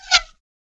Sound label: bow fire